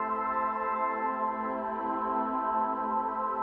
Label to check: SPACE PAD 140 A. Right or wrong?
Right